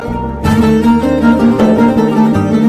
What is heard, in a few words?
ringtone sad; zang gooshi